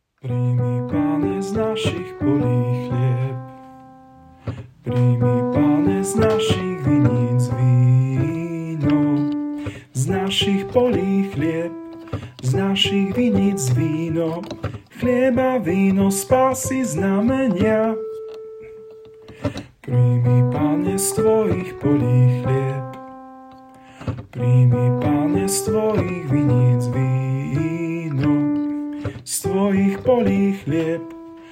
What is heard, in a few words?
tenor